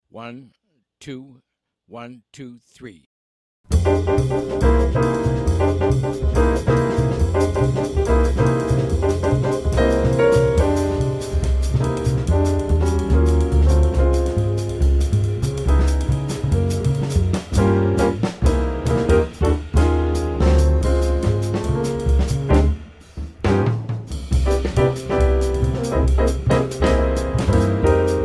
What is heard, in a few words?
minus melody